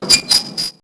Door Metal Small Closing; Max Payne

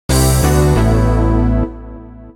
ball drain, Pinball; Pure Pinball

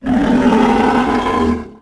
Roar, Dino; Toro

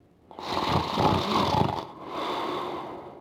TRACTOR SNORE; Cars; XBOX

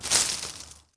bush snap; Star Wars Obi Wan; XBOX

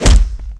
hit body, skater; Tony Hawk's Project 8; XBOX

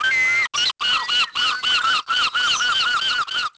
seagulls; Pirates Of The Caribbean

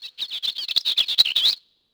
houses bird; Tony Hawk's Project 8; XBOX

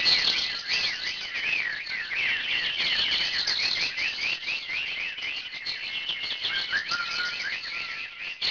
birds, JUNGLE; AMBIENT; Pirates Of The Caribbean

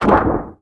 Foot Duct, Footsteps

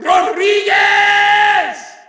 Shout, audience; UFC Tapout 2

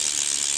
bmx freewheel; terrains; Tony Hawk's Project 8; XBOX

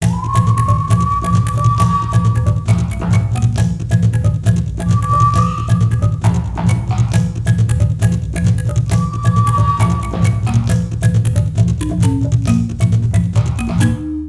Vine Swing, Music

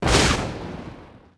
explo small; impacts explos

